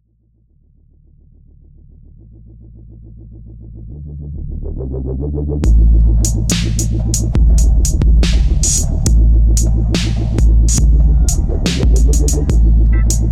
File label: Garageband dubstep